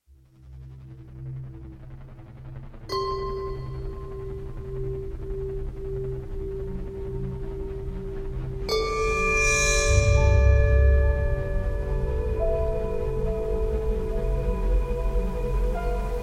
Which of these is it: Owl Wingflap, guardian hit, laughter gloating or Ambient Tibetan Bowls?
Ambient Tibetan Bowls